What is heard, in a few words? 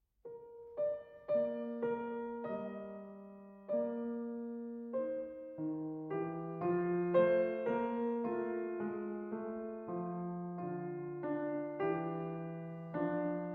Russian Folk Songs; Tchaikovsky 50 Russian Folk Songs, TH 176 - 47. Vanya Was Sitting